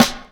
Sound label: snappy snare drum sound a sharp key; Mono; snare drum samples